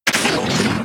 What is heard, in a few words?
death; Barotrauma; Mudraptor